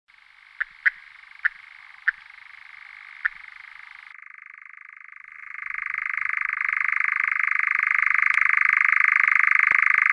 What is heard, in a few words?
Nightjar